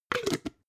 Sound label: jar glass plastic close cap